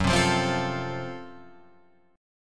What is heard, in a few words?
Gb Minor Major 7th Flat 5th, Guitar, Drop D, chord